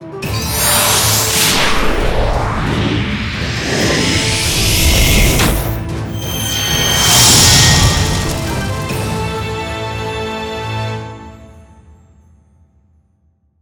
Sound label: jingle skill levelup; sengoku taiga android ios mobile gamerip 2018